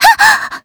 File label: combo break; osu